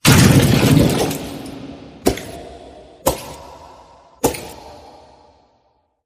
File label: Blood Drips; Red Dawn